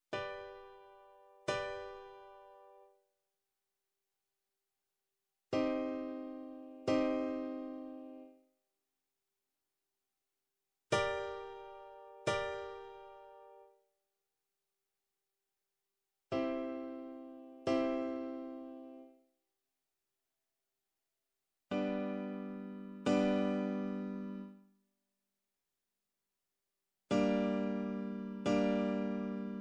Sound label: Major minor